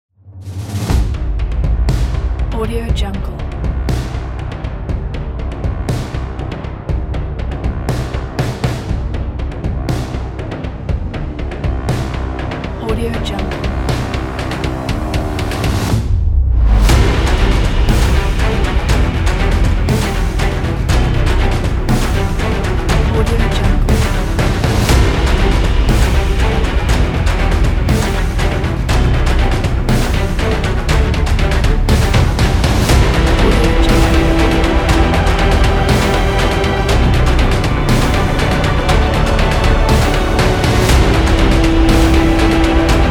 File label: epic dubstep